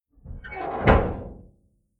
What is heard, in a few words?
Old Metal Hatch Closed Creaky Eerie Boat Ship; Sound Design; Hit Sound Effects